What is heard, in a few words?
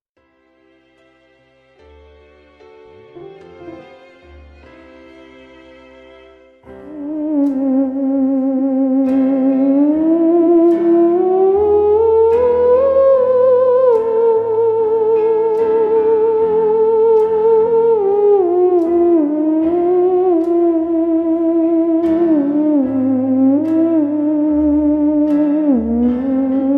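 theremin; Терменвокс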